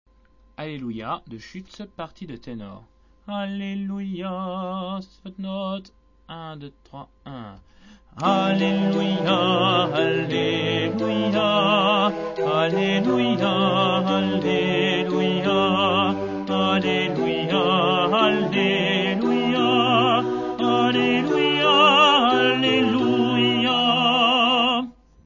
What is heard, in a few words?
Alleluia tenors; schutz